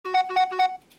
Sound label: Vivo iQOO Z8 Sms Ringtone